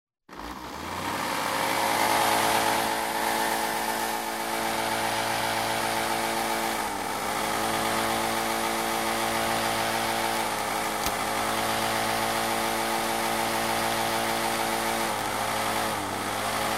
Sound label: traffic